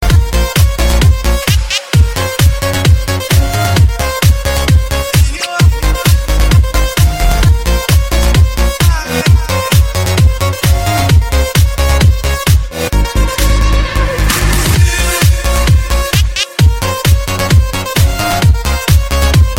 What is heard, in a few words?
Night Club